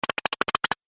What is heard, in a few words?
Robot Stutter Movement, sci-fi robot sound effect